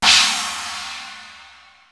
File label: CHINA CYM; BEAT2000